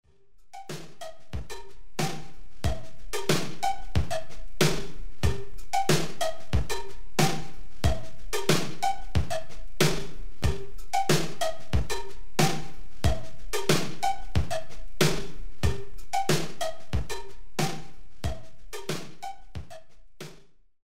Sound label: beat